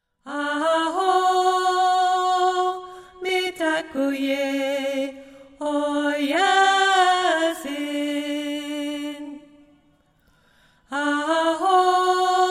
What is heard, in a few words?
alto; aho mitakuye oyasin; sing for water 2017